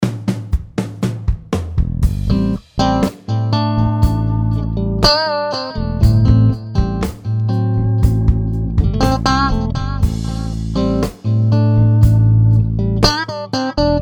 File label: Clean